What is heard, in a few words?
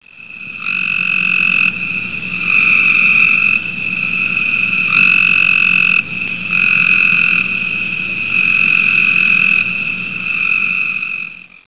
Copes Gray, frogs and toads